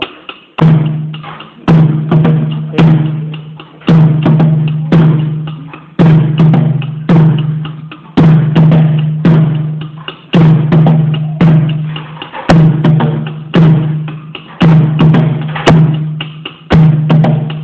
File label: Sangpan